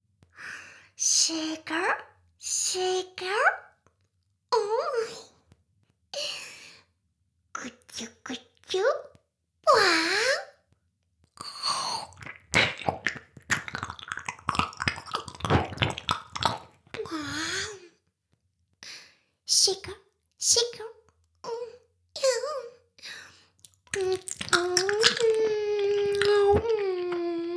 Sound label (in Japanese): シコシコ音声; 魔法少女マスカリマッスル